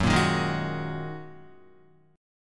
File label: Gb 6th Add 9th Gb down Guitar